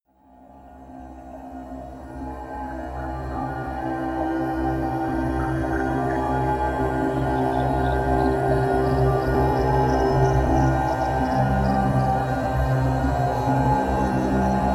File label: drone complex drone